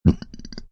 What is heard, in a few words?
Burp Closed, Burp, short, closed mouth (human voice)